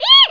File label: eek; rashdemo; Chip 1996 November